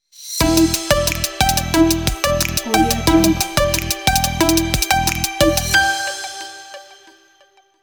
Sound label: simple logo